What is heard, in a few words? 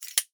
meat scissors open seperate